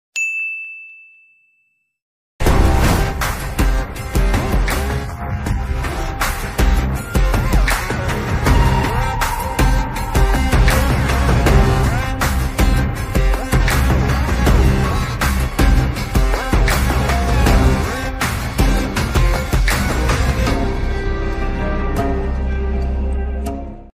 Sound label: tamil movie bgm attitude bgm; garudan; soori; tamil ringtone